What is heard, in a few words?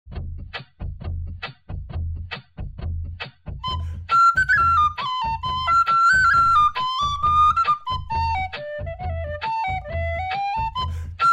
whis bodh; kerrypolka